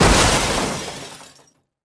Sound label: Car Crash